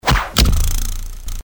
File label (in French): Lancé de couteau; bruitage; Armes